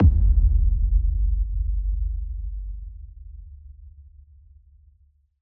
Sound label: Impact Dark